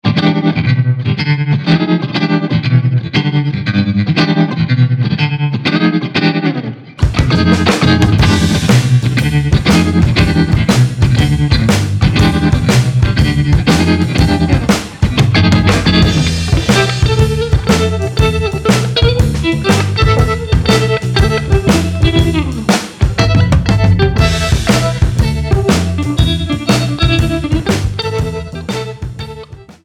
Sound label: Rotary style Tremolo